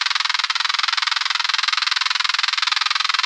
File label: Myopsalta melanobasis Brigalow